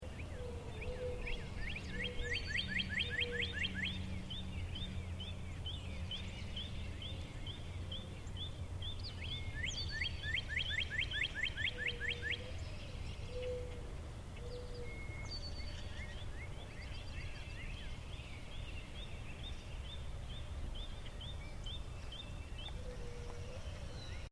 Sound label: Pretty Forest Birds, Cheerful birdsong; Nashvillle, Tennessee; Seamlessly looping sound; Bird Sound Effects